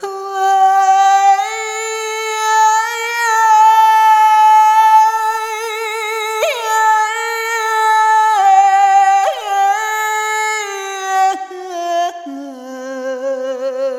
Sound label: Arrhythmic F